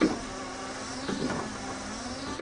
Scheibenwischer; volvo 9900; Vehicles; AI volvo 9900; Freyfurt Beta

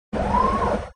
Retarder aus, volvo 9900; Vehicles; AI volvo 9900; Freyfurt

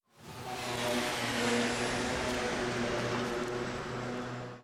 Anfahren; Vehicles; GT6M

